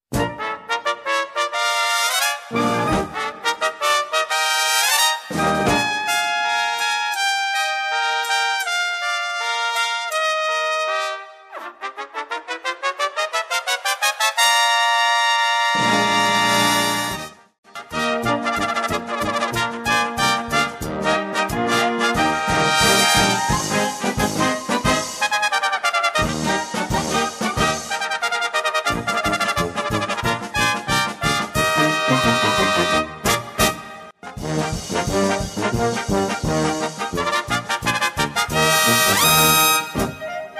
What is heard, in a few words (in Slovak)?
gallop pre 3 trubky